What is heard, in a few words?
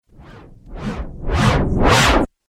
Riser Abrupt Stop Chorus; Rise And Dive